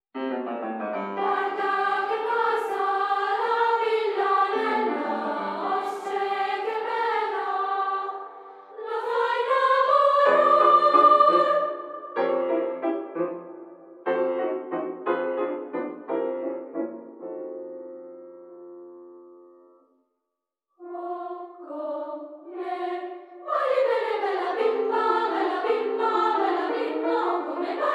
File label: school Choir